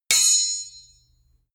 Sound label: sword hit sweetener